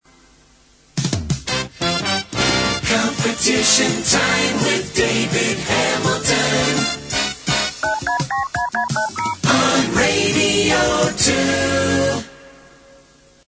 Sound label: jingle